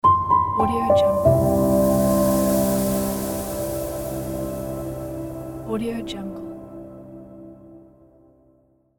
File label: soft logo reveal